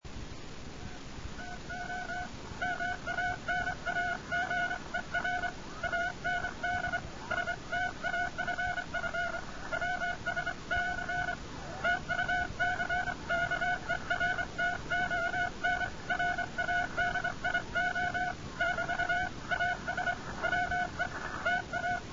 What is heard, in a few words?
morse